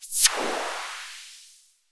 Whoosh, sci-fi

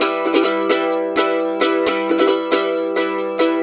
riff, Blues Guitar

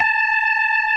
CLASSIC C3, Synth and Organ; InVision Interactive; Keith Emerson; The Most Dangerous Synth and Organ

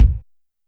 BD Soft; YAMAHA RM1X

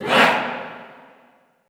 ALL WAE, Classical Choir, SHOUTS; Best Service; Extended Classical Choir

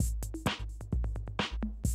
130, Classic DrumLoops

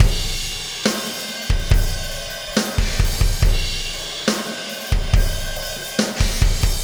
Impact Crater Beat, Processed Drumkits

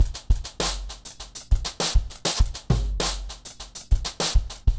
Remixed Drums 100BPM; The Roots Drumloops

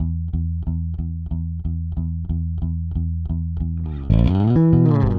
Rock, Bass; Bass X

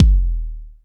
BD, Bassdrums, Roland TD-20

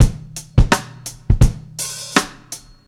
84bpm, Hip-Hop Street Beats; Hip-Hop Street Beats Construction Kit; Street Beats by Poogie Bell

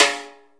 Snare, Akai MPC-500; Toolkits; Snare TKit

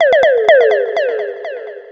DRUMLOOPS, Disco; Best Service ProSamples vol.48 - Disco Fever; AKAI

